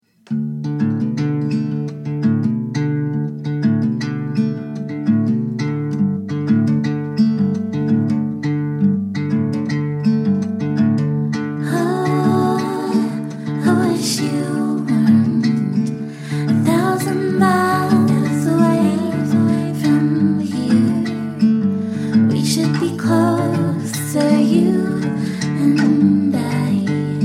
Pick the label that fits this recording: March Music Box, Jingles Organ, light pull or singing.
singing